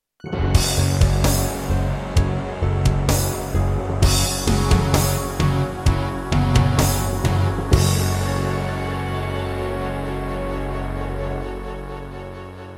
Roland JV-1010

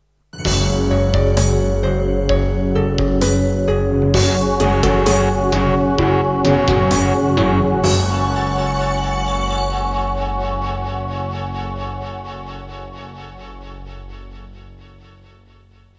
Creative Sound Blaster AWE64 Gold; Doom (Sigil); Introduction

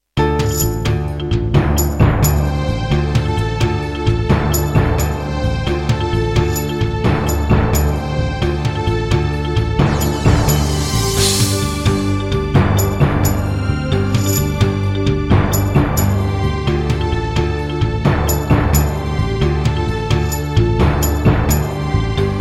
Terratec Wave XTable, wavetable; Heretic

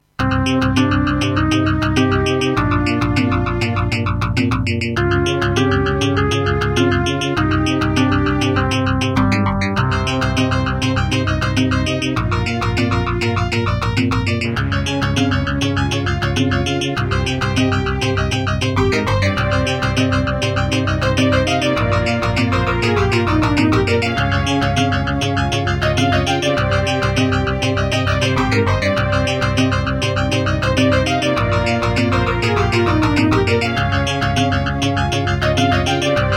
Creative MIDI Blaster MB10; Hocus Pocus

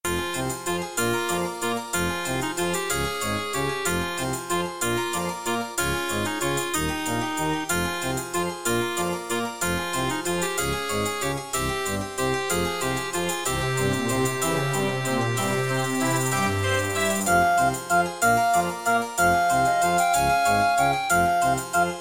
Track 6, Yamaha MU500 GS MODE; Death Gate

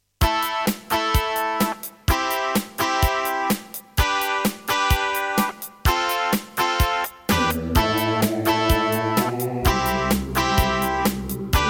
Roland SC-33; raptor call of the shadows

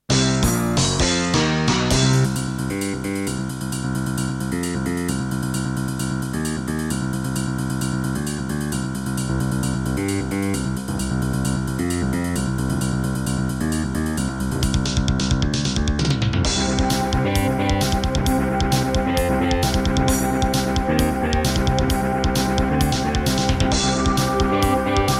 Creative Sound Blaster AWE32; sigil doom episode 5

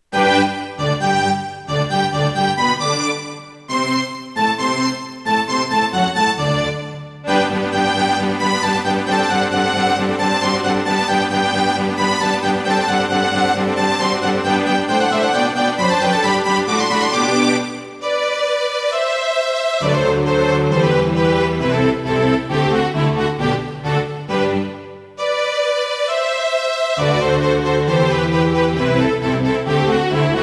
Roland SC-8820 88 MAP; Mozart - Eine Kleine Nachtmusik partial; classical

